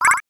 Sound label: meaty, ringtones; standard ringtones